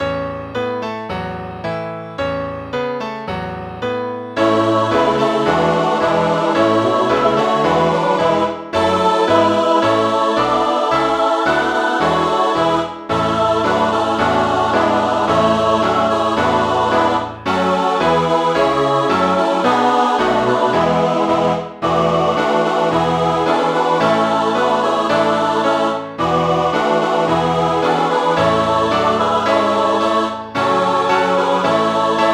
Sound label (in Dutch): Slaat op de trommel